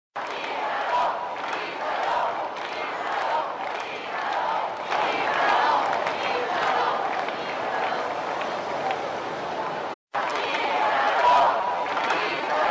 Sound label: chant italo